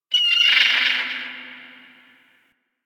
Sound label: Bird Seagull; Ambience; One Offs; Warframe; Eidolon Plains